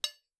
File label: metal small; Phasmophobia